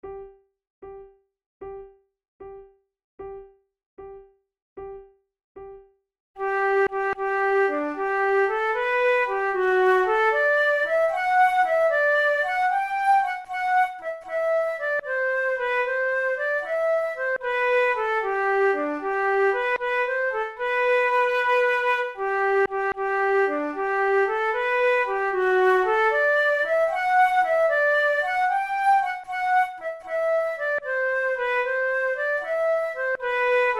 duet, flute

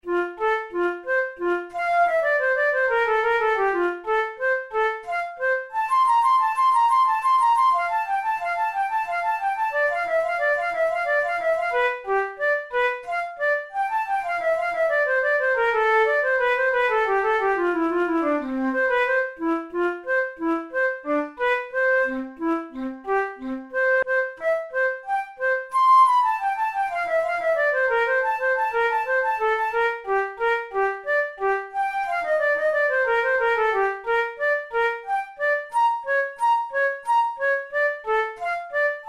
in f major, flute

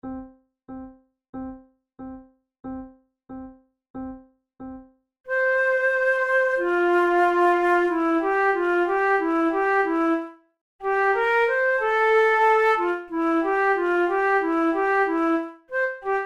methode de flute; tulou

